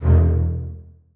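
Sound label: basses staccato d#2; Sonatina Symphonic Orchestra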